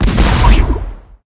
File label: skill explo